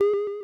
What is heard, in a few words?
key open